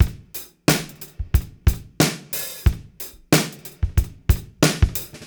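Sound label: 91 bpm, beat loops; Zero-G; Monster Beats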